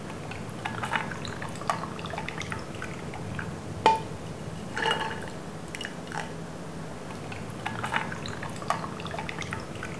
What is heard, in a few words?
asmr